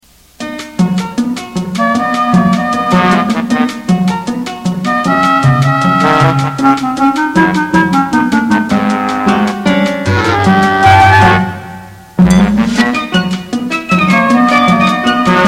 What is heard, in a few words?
music